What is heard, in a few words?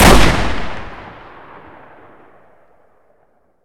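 Weapon brushgun fire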